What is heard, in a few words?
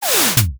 starter camera transition